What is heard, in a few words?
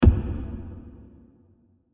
Deep Loong Wood Impact; Miscellaneous Impact; Impacts; Crafted Signals